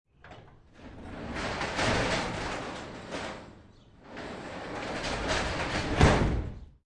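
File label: Door Garage Open Close; Glovers; Big Room Sound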